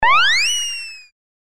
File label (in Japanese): ピューン 飛んでいく音